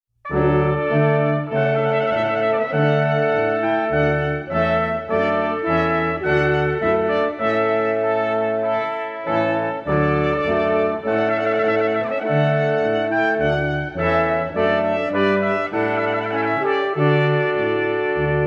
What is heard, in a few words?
Brass Quintet Trumpet Voluntary; Jeremiah Clarke